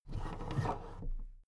big plastic case raise

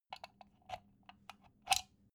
socket pull plug soft long

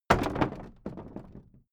old wood case drop

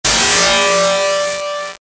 rough distortion

